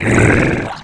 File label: DROWN; sorbogon